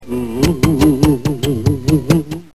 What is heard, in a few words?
kisses